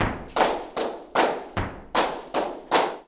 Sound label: flamenco tapping, jaleo